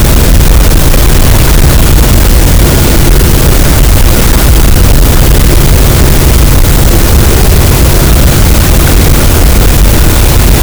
cachalot, gaussian noise; Physeter Macrocephalus

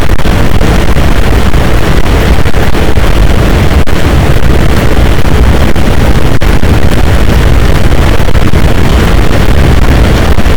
cachalot, boat; Physeter Macrocephalus

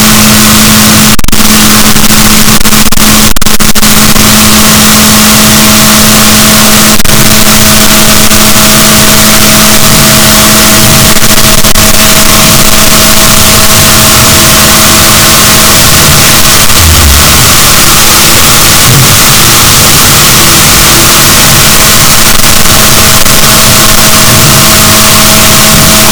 rorquals negatif, Balaenoptera Physalus; boat; SNR -6dB

